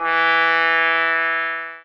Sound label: sax